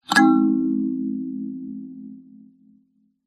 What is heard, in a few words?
musical karimba tine strum